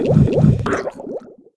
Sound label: DROWN; Darth Maul